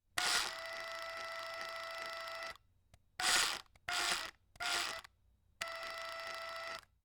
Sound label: small reciprocating saw weak